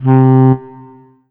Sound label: Korg 01W Alto Sax C3